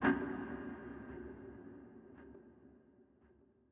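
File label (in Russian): длинный ревербератор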